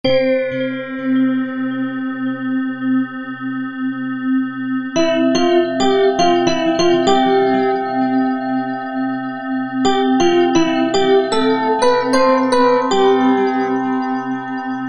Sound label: Sounds Of Space